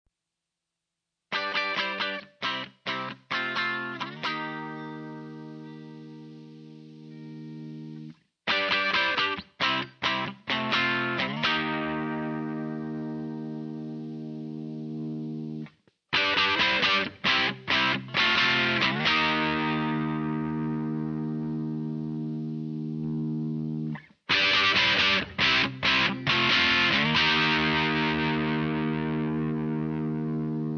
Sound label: Rock